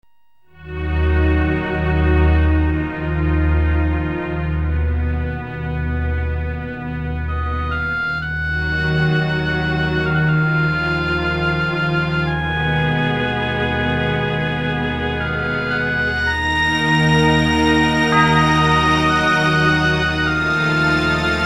Solemn Dirge; Legacy of Kain: Blood Omen; Willendorf

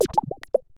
Swallow

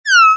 Kitty sad cat; gamerip; Robot Wants Puppy

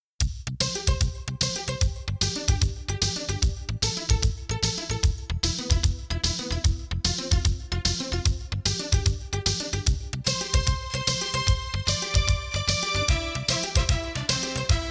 Pachelbel's Canon; Lemmings; PC-Engine

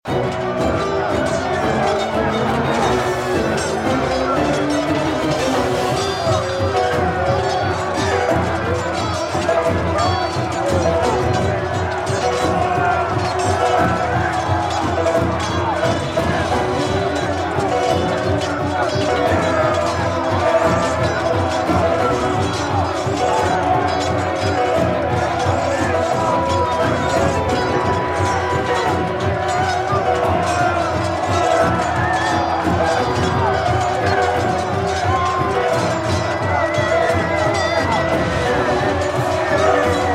oe battle w sfx; Oriental Empires

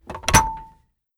Card Lock

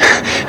Fatigue Hard

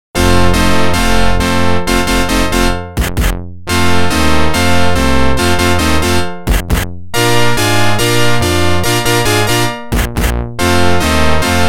Organ; NHL 94

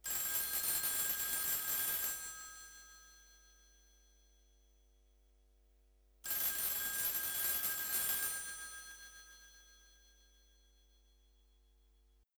SFX Phone Ringing Old; this is the zodiac speaking 2020 gamerip switch ps4 xbox one pc macos

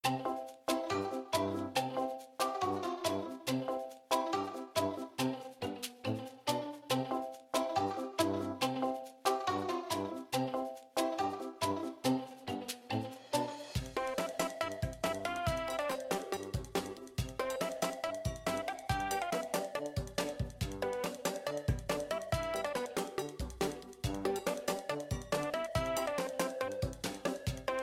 Ingame Music; Bucketz

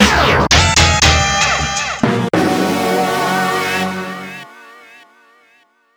Defeat – Jingle; Splatoon 2; Splatfest

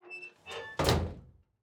Morgue Door Close

